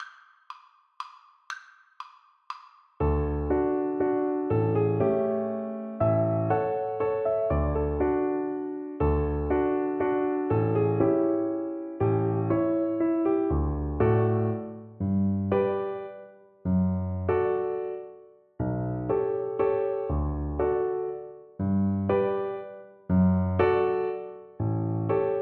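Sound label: VLC karaoke, Cello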